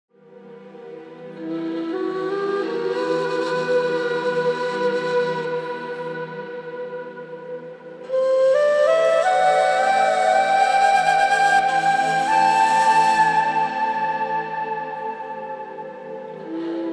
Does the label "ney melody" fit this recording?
Yes